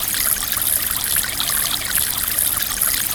NILE, JUNGLE FX; Spectrasonics; Heart of Africa 1

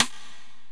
Korg x5, Drums

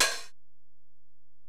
HiHat, Alesis DM-PRO

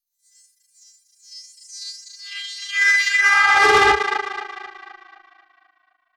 Filter Proc, alarm siren samples, 120bpm; musicradar

